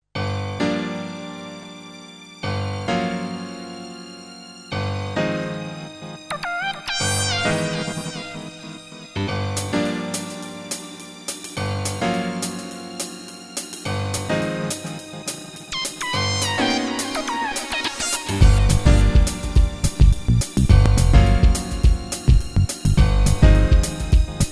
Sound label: jazz; emx1